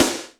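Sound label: GATE snare, drum; Crypton - DrumBase5000; GATE KITS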